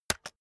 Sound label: keyboard external space hit fast